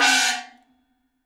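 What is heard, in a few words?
CYMB FX, cymbal; Univers Sons - Raricussions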